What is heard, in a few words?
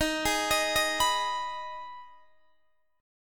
Eb Suspended 4th Sharp 5th Eb Guitar DADGAD